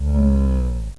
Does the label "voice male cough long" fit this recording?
No